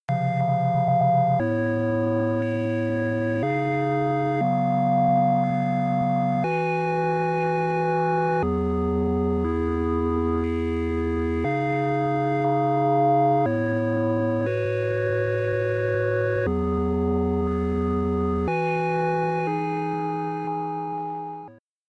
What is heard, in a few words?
EFX Freerun Serial